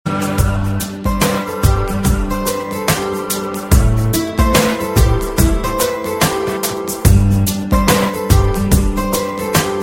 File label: Msg Tone